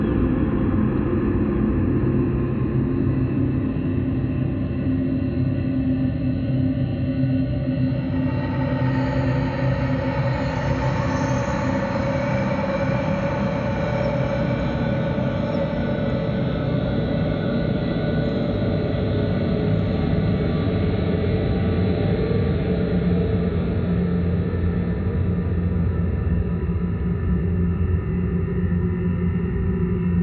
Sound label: Rasp Loop, Horror Atmospheres Music; HauntedBloodlines